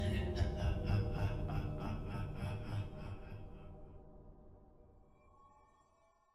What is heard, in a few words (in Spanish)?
Risa